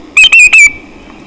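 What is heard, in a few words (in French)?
grand chevalier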